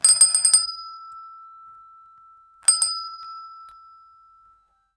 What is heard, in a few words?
shop bell ring footsteps